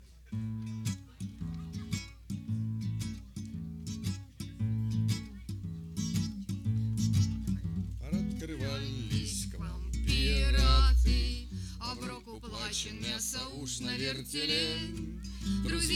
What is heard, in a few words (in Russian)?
"Костер" - Пиратская песня